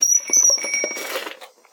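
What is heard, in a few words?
bicycle stop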